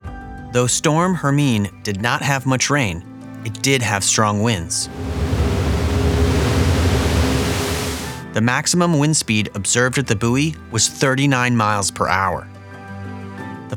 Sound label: wind intro; Hermine Update